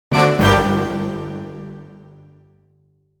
Danse Macabre - Big Hit; Incompetech

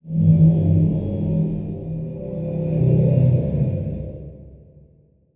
Title Transition Ghostly; Adobe; Production Element

